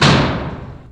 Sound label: Clank, Industrial; One Shots; Drums; Misc; Sonic Foundry (Sony Creative Software)